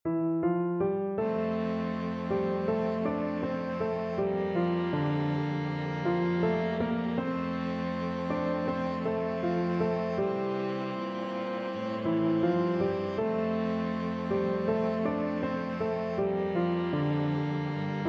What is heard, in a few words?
danny boy, piano quartet